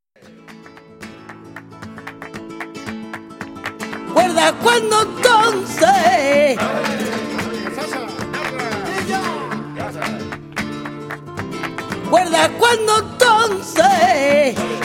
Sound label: Solea de Frijones et Fandango por Buleria